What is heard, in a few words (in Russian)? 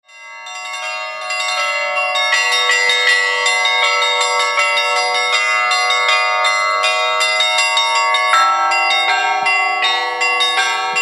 zvon